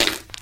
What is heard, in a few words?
fart short burst